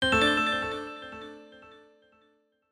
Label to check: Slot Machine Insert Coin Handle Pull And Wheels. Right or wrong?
Wrong